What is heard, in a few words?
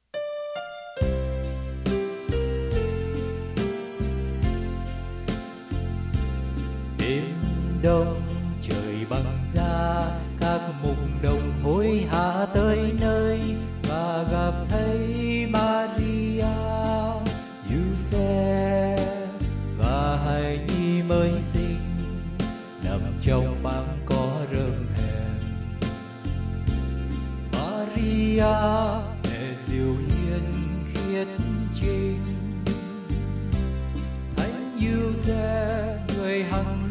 BASS